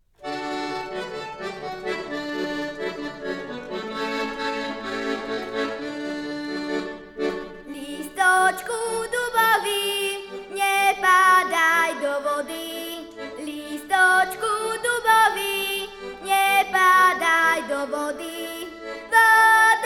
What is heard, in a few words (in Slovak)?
s harmonikou